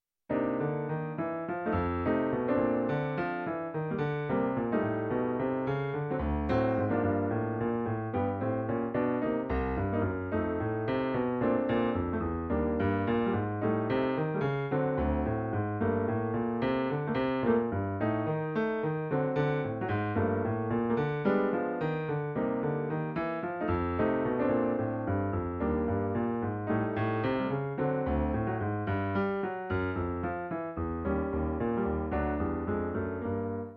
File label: bass